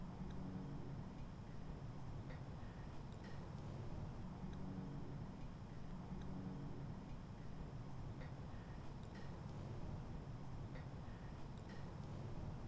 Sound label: White Noise Building